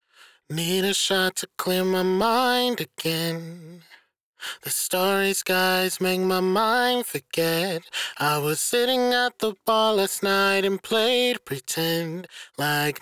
Main Vocal DRY, Acapella, 110 bpm G Major; Ghosthack; Emotions- Acapella Collection

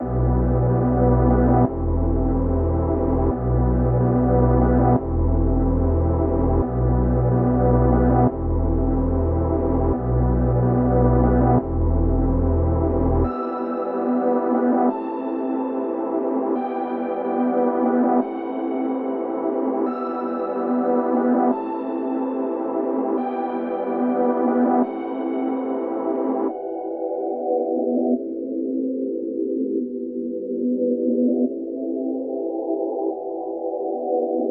145 BPM E Min, Melodies Reverse; Cymatics; ZODIAC Beta Pack

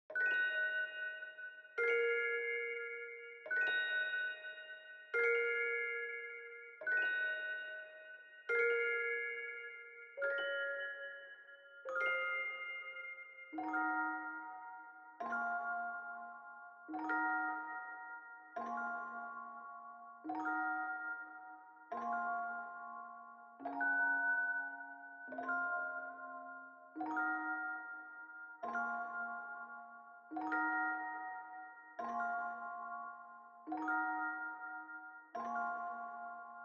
143 BPM A# Min Bell, Hip Hop; Melody Loops; Loop Stems; Cymatics; Americano

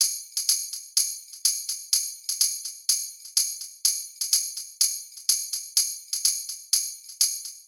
Alternate Drum Loop 125 BPM Tambourine; Analog; Cymatics - ANALOG EVOLUTION Beta

